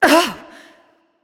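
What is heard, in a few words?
ouch, female; smite; voicepack; hera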